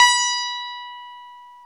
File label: Sitar; Club-50 - Foundations Roland; Dano Sitar; Dano Sitar2